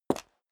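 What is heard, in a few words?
step high heels concrete grit single left, footsteps; schritte; menschen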